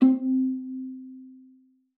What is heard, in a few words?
C4, VIOLA PIZZ; Xsample VOL-5; 90 s Sample CDs